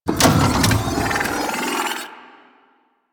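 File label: Ship Power Missile Volley Charge Up; Warframe; DE; Vehicles; RailJack; RailJackPowers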